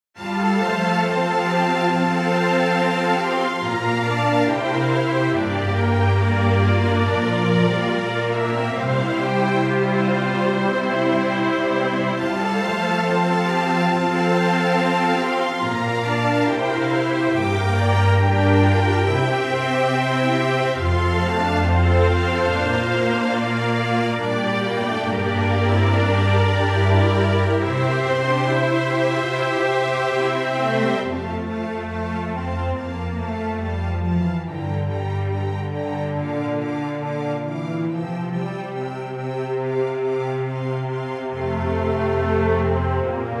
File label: happy strings